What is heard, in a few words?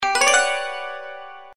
alert; YuGong